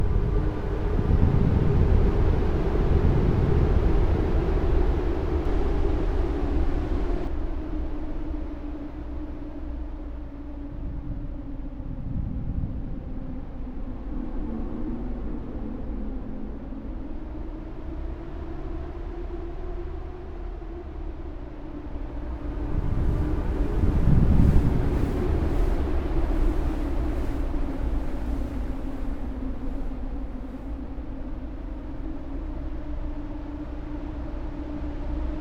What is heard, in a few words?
ambient wind